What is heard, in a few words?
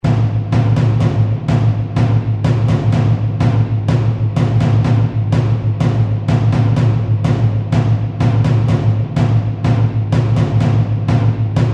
tension Loop; Dynamite Digger Eureka